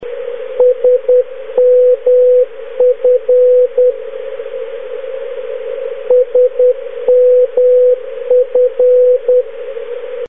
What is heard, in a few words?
sheffield airport; south yorkshire, uk (now closed); europe